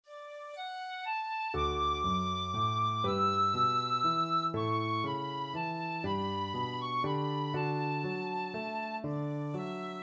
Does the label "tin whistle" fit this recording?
Yes